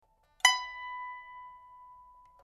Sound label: pipa; chuYinKouXian